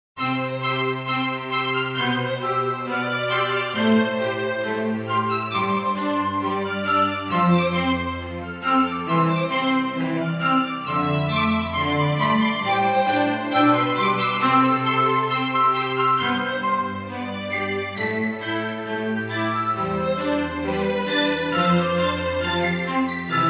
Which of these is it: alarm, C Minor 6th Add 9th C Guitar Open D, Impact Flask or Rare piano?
Rare piano